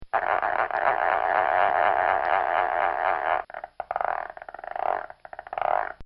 Cartoon Sound Effects Cranking; Cartoon Sound Design

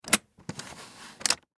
Luggage Bag Handle, Luggage Bag Handle Slide Out; Foley; Blastwave

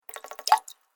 Water Fast Little Bubbles; Water Sound Effect; Splish, Splash, Splosh!

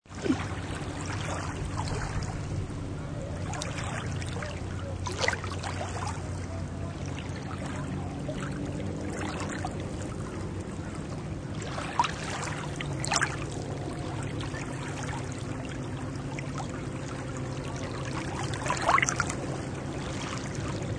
Lake Waves, Waves gently breaking on lakeshore.; Rivers; Nature

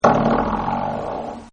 BOING, Cartoon; Boings; Cartoons; Partners In Rhyme

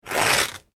paper sugar rip, Paper Ripping (Sugar Paper); Sheet Of Sugar Paper Ripping / Tearing; foley; Objects; McKinney Sound

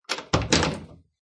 Wooden Door Close; Miscellaneous Door Sound Effects; Lynne Music

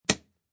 Ski Binding

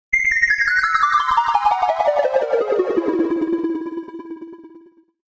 Scifi Audio Logo Ident Retro Downwards Spiral; Wipe Sound Effects

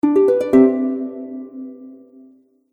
Fantasy Harp Stinger; Musical; Musical Sound Effects

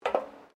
FOOTSTEPS WOMENS HIGH HEELS ESCALATOR STEP

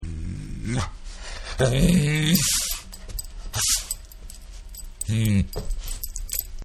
Dog Agitated; Dogs; Sound Effects:Animals

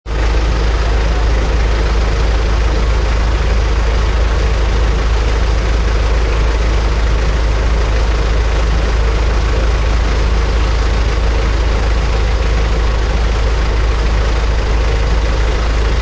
Large truck idling.; Trucks; Vehicles

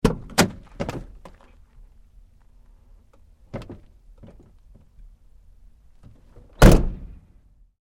Truck GMC Savana Door Open-Close Hard; 2010 GMC Savana; Vehicles; Big Room Sound

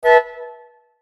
Chime Stab Light Harmony; Blastwave

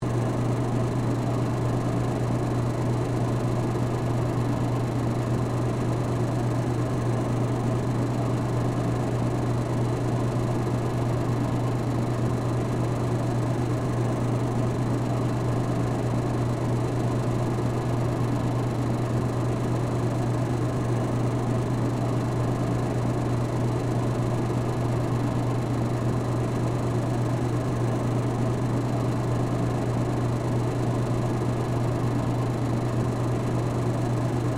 Fan Public Bathroom, Office, Loop

